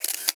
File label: camcorder capture; Nokia; 6630